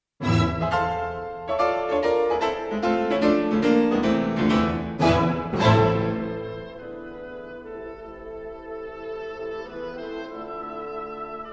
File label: PIANO CONCERTO 1ST MVT